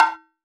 H kotsuzumi, Tsuzumi, Percussion; Roland BK-5

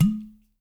small pot, pots pans; Spectrasonics; Liquid Grooves

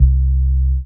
DM BAS, Dance Mega Synth; Best Service

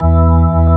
ORG VK, B-3 ORGANS Vinta Keys B3

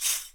TAMBORIM; E-MU Producer Series Vol. 5 – 3-D Audio Collection; 3D Percussives; 3D Ethnics

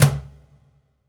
Cajun, Percussion One Shots; Essential Percussion

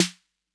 808 SNARE; Concrete Collage Drum Kit; Concrete-808

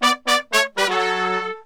120, BRASS SAMBA; Best Service ProSamples vol.14; AKAI

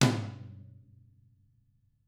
TAMA, Drums

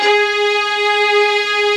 SPARCO VIOLIN

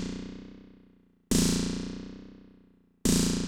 FEEDBACK, Techno 138 BPM; Best Service ProSamples vol.54; AKAI

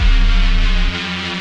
RAVE, RAVESYN

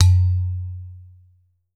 udu, percussion; BRT UDU D; Voice Crystal - Steve Reid Definitive Percussion

